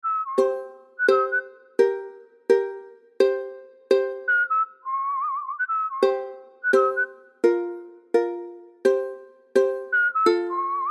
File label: Whiskey Whistle